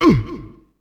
ATTACK; UFC Tapout; XBOX

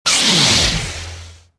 enemy saber on; weapons

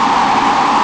sam flyby, weapons; Soldier Of Fortune II Double Helix; XBOX

